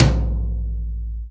TT hi Brush kit, Electronic Jazz Kit; STYLE KITS (Hip Hop, Etc)

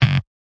Kick Elec Shock; REAL LIVE KITS; Osama Qureshi DrumKit

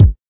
kik

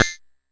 Jomox MBrane, drum machines; Jomox MBrane 11

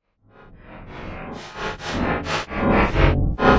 Crunchy Crank, Electro and Synthetic

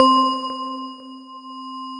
Synthesizer Middle C Notes, Lead

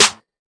urban trap snare; Urban Trap Kit; Hip Hop; STYLE KITS (Hip Hop, Etc)

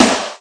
R8 Snare, Roland R-8 drum machine

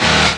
machine grind; Weird and Interesting Hits; single hits

